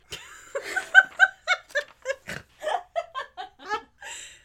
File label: Snort Laugh